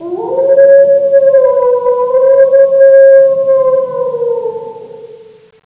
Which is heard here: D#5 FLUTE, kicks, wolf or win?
wolf